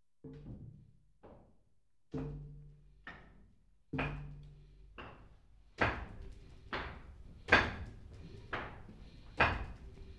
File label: rock concert